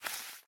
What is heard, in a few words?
Paper Move; Game rip; MiSide